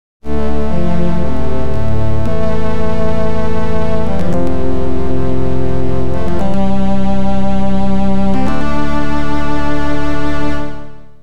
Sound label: OPL2; Game Over Solo; Tyrian